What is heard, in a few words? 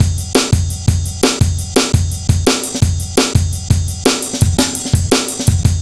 165, drum loops, drum & bass; Total Drum&Bass